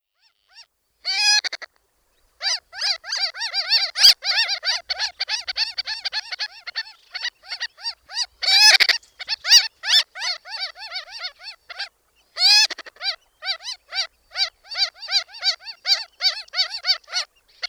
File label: Ross's Gull